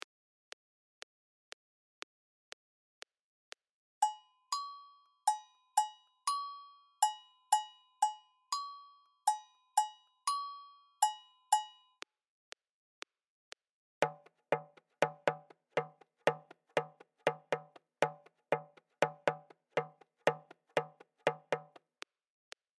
Partido Alto 120; Lateinamerika

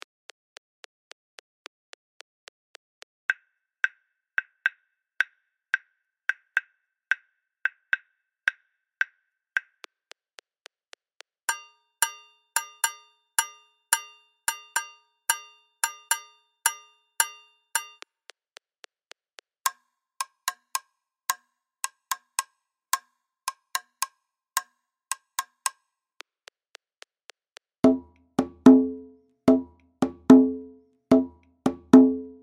Palo 110; Lateinamerika

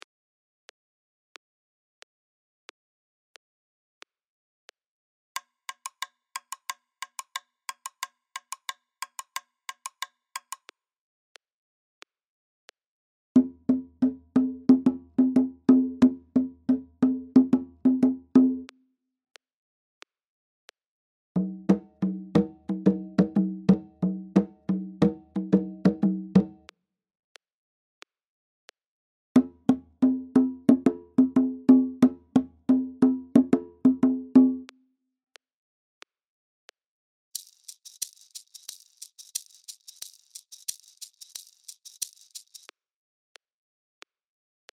Holandes 90bpm; Lateinamerika

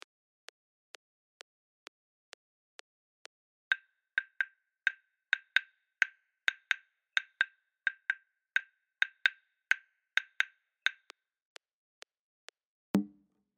Balakulany 130bpm; Afrika

